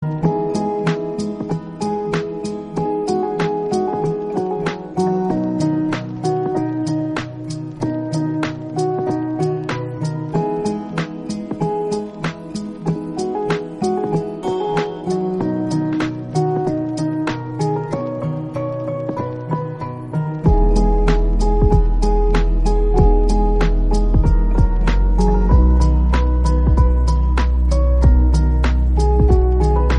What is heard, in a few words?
LOFI INSTRUMENTAL RINGTONE